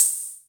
Cymbal C78; Drum Machines; Drum Hits; Ableton; Factory Packs

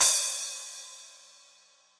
Splash 12 Big Punchy, Cymbal; Drums; Drum Hits; Standard Hits; Ableton; Factory Packs; Session Drums Club

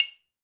Claves C; Latin Percussion; Drum Hits; Wood; Ableton; Factory Packs

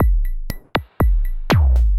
ARP 2600 Drums; Ableton; DM ARP 2600 Drums by Flatpack

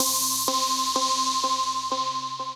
Marimba; Mallets; Ableton; Factory Packs; Chop and Swing

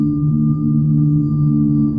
Nice Drone; Tree Tone Presets; Ableton; Factory Packs; Inspired by Nature by Dillon Bastan

